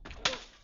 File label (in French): splash